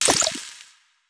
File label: Projectile Burst